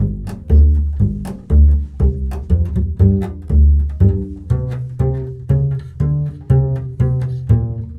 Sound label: Bull Fiddle, Appalachian; Continental Drift Aftershocks